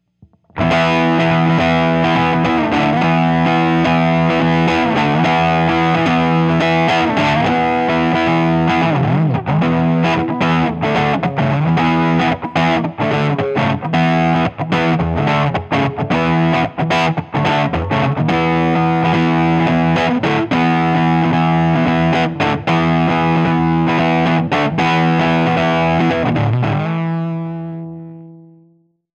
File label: bassman Distor